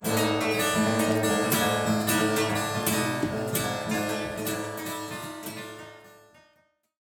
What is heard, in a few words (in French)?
guitares